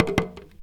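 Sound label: small tama hit; Best Service; World Colours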